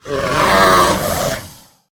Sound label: Hungry Dragon Death